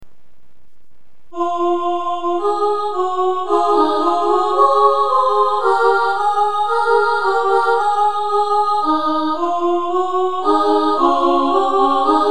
sop1 sop2, choir

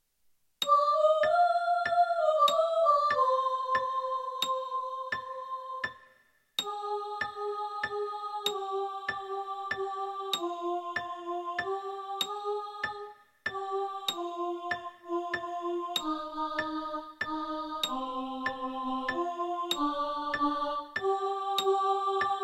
alto, choir

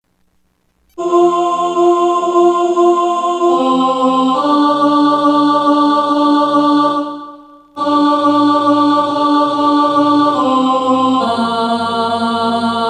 Alto

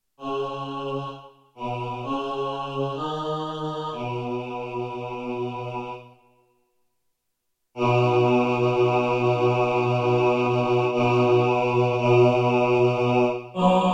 dawn bass, choir

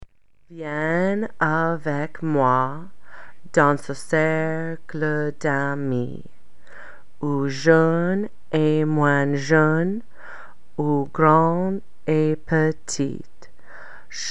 french, choir

